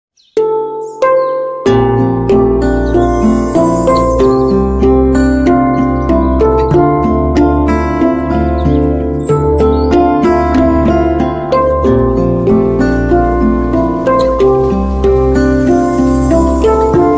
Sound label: handpan 10 notes